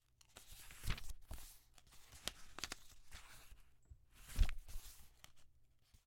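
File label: flipping pages in a small notepad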